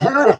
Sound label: Boss Take Hit; Puna; Playable Tutorial Level; TR3